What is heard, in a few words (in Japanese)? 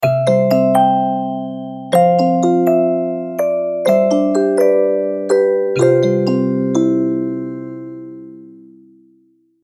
ミステリー オルゴール